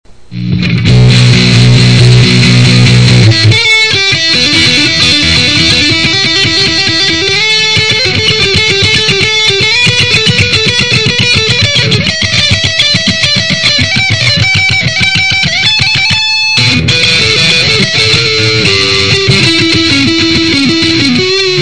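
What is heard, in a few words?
solo thing, guitar acoustic; electric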